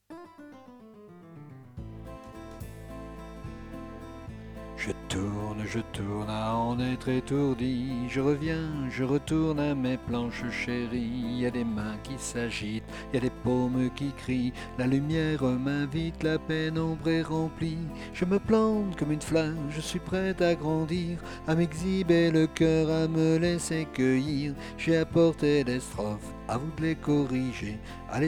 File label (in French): Je tourne alto